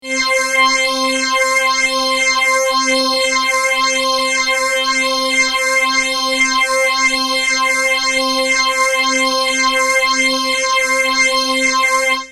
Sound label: maestro ps1